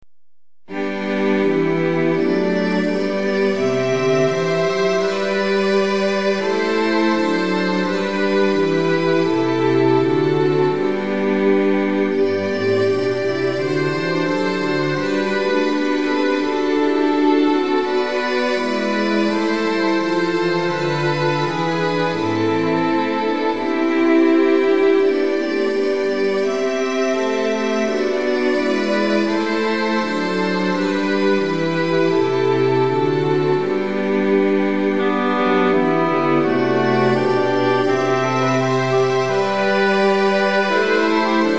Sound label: Midi Theme Fl Ob EH Fg VC QN 84